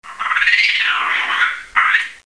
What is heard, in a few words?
jungle; Renaissance